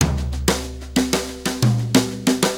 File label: Brushes Fill